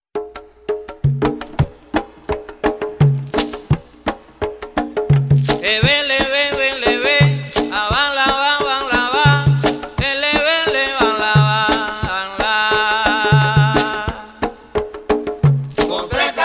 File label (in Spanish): con tre tambores bata